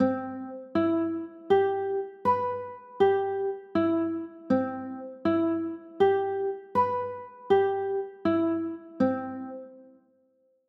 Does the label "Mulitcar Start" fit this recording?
No